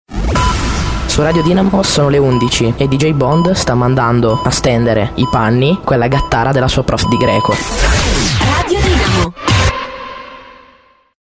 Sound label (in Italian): segnale orario